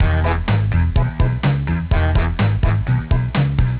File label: Horn